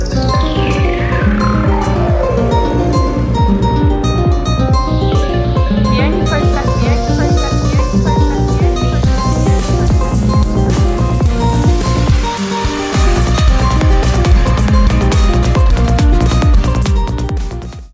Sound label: Xiaomi Redmi Note 11 Ringtone